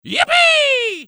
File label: correct